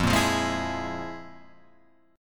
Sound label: F Minor Major 7th Double Flat 5th